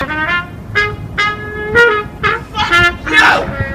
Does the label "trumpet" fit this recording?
Yes